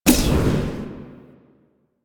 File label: Sticky Bomb Throw; Grineer; Veilbreaker; Vor